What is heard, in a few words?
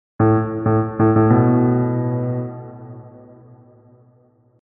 piano sample